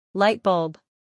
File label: light bulb